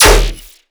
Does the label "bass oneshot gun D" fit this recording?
Yes